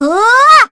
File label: Vox Casting; en; voices; heroes; Sonia; kings raid